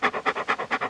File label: dog pant; animals